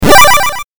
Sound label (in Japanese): 8bit 獲得